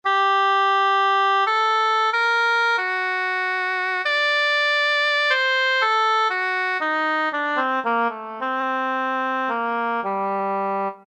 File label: OBOE; Roland SN-U110-06